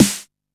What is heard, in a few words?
Snare, Drum & Bass